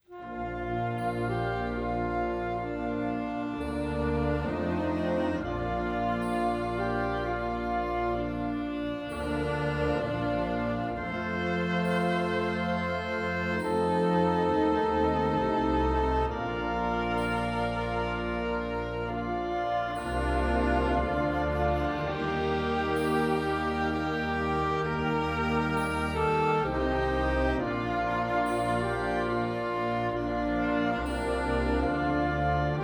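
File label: Alto Saxophone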